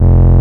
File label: Taurus II, Moog Taurus; Greytsounds VOL-1